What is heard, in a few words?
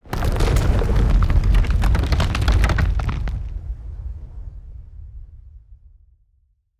building shaking, ambience